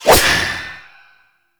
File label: Void Dagger Whoosh, melee weapons; Warframe; Zariman Dagger; Void Mode